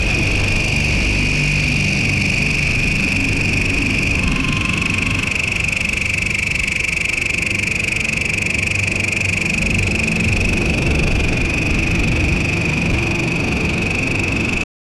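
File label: futuristic drone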